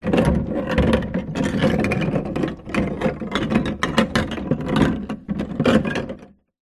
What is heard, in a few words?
food drink bottles beer in plastic esky filled water movement; zapsplat